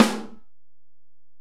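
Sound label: Acoustic Snare, Alesis DM-PRO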